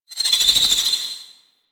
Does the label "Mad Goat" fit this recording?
No